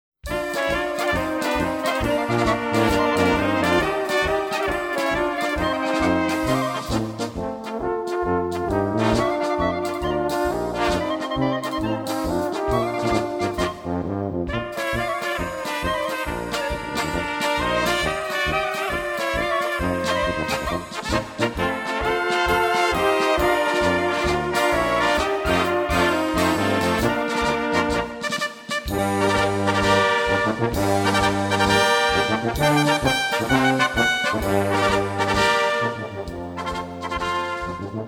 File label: Instrumental